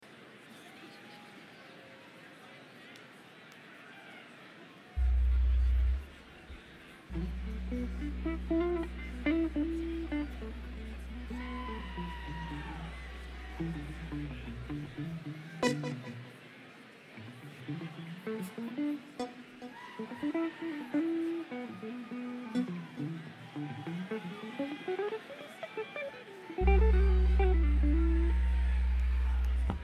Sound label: Crowd